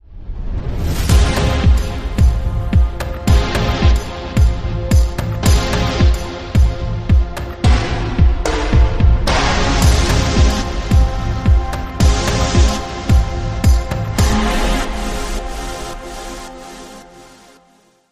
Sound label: Atmospheric Distortion Sting